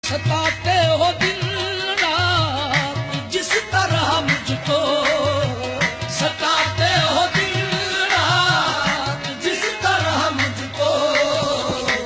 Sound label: Qawwali Ringtone